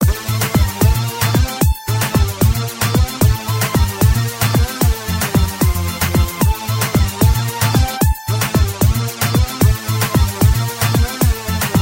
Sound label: Fast Beat Sms Tone